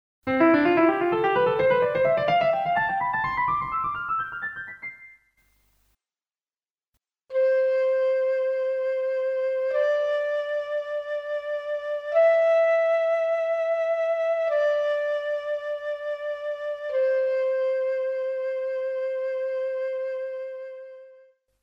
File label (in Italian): esercizio suoni corti lunghi